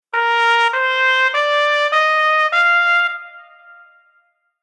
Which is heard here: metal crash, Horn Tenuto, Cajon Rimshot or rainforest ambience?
Horn Tenuto